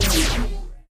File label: Space Shot; doodle jump 2; android